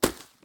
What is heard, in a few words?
grass step; Golden Crayon Sounds